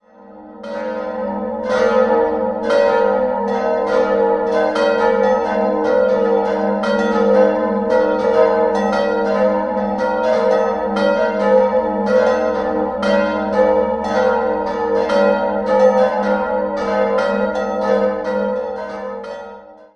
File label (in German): Elbersroth alt, Glocken; Pfarrkirche St. Jakobus d. Ä.